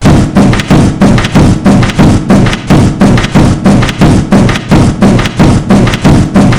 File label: footsteps looped